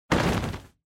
Motion Fall Short

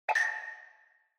Percussive Accent Reverb

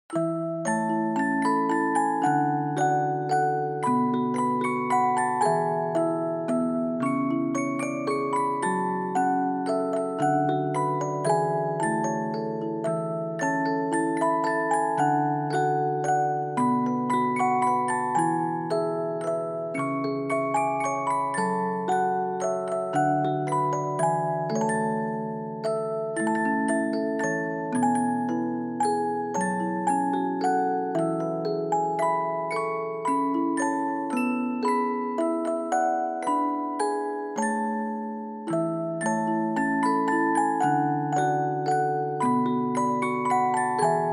Reverb Fast; bgm; We Wish You A Merry Christmas

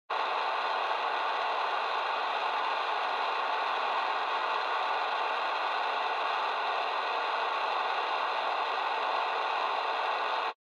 Radio Signal Noise AM

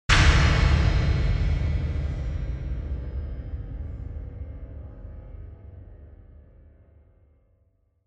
Impact Low; Onoma

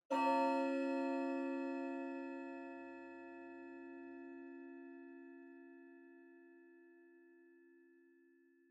Pendulum Clock Chime Far Low

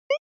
SNES Racing Select

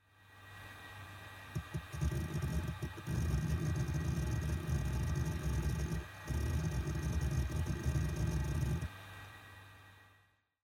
Hard Disk Drive (Operating Noise)

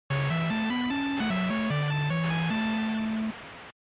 NES Racing Clear

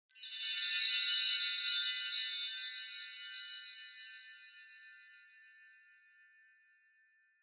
Cluster Chimes Reverb Short

